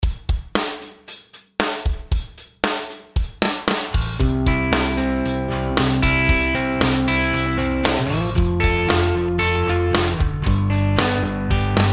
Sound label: chord progs maj root